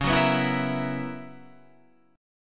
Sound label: B Major 7th Suspended 2nd Suspended 4th C sharp, chord shape